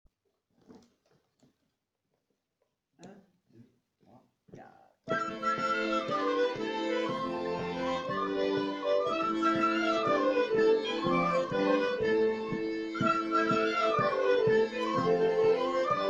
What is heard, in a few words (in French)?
2e partie flutes intro Accords MG grille diatos; Cercle circassien; Megastive